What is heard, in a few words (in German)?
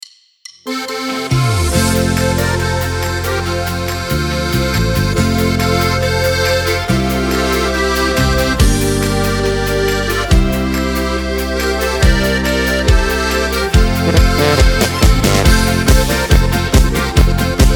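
Karaoke ohne Chor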